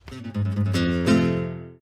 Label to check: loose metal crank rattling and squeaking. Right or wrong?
Wrong